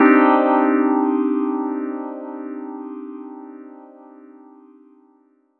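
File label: Cmadd9 chord; Flowerz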